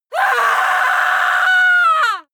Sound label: female scream horror